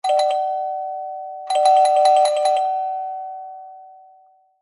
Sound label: household doorbell ring fast several impatient